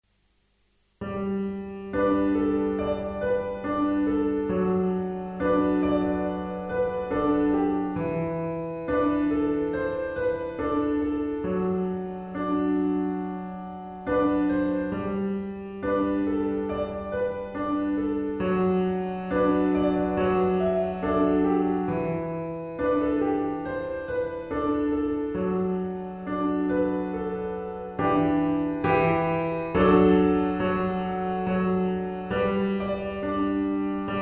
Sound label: piano pop